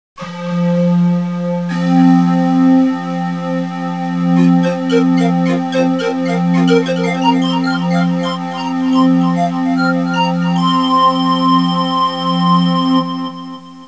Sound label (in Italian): audio suonerie musica mistica